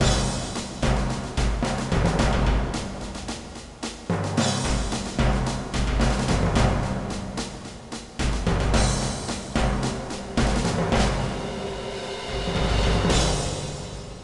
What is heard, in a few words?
sounds music; Halo Combat Evolved; Xbox